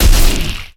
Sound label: Ranged Magical Heavy; Kilta